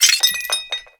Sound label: ceramic vase shards